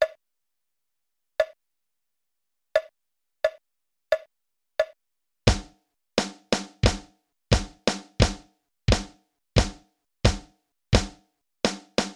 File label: Climbing Stairs, drums; Drum Recordings